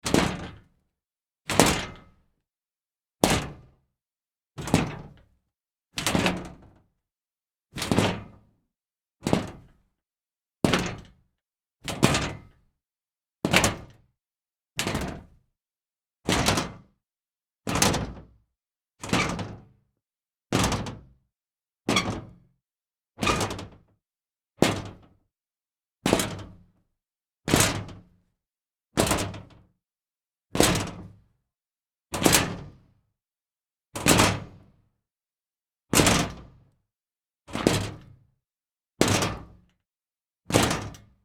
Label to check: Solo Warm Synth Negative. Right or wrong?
Wrong